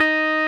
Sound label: Roxichord D#4, RMI E.Piano; Keyboards of The 60's and 70's